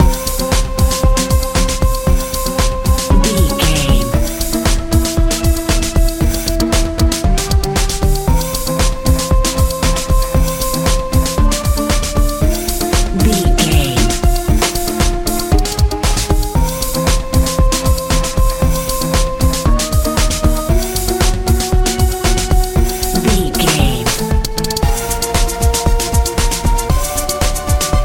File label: modern dance